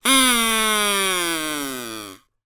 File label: cartoon duck quack whistle single long